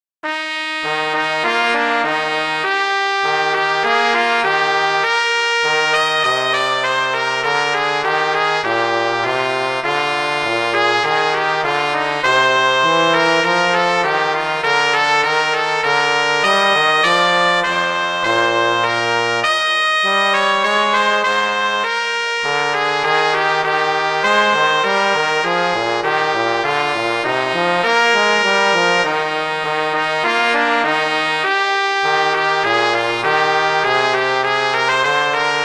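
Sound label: Duet, horn; Mozart; Duets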